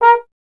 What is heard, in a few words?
Trombone A#; Miroslav Vitous - Solo Instruments VOL-1